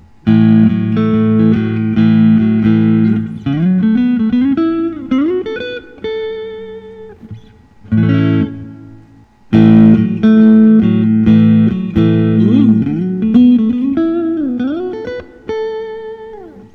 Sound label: seuf oh 19 black seuf oh 19 black